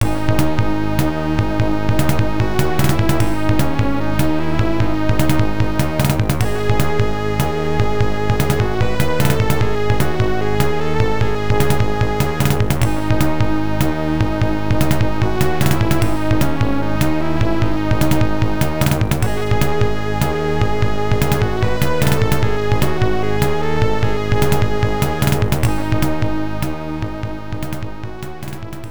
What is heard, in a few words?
Loading Menu; Angelic Warrior Deva; msx2; opll